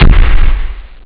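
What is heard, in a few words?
snare drum; Amiga Soundtracker sample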